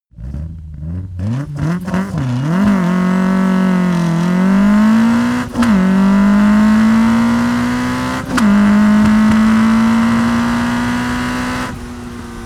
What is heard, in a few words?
car opel kadett fast acceleration gear shift